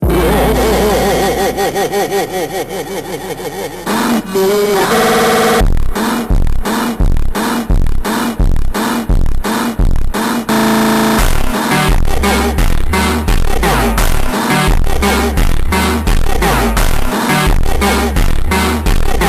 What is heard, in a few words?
funk rush laugh ultra slowed brazilian phonk